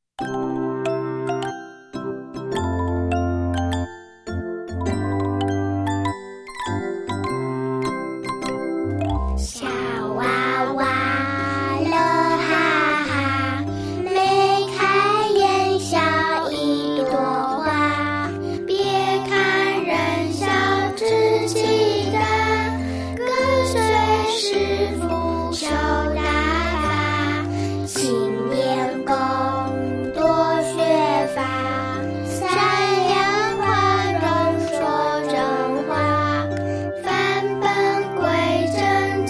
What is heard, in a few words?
vocal